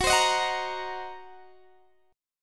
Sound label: Gb 6th Flat 5th Gb down Guitar DADGAD